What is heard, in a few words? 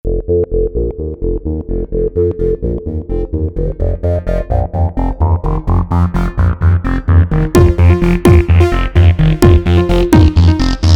buildup